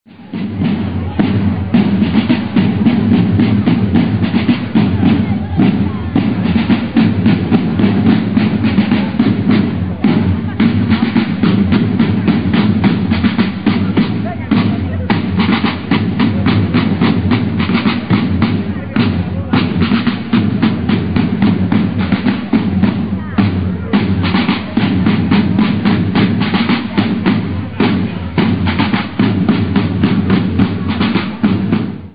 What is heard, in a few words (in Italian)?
tamburi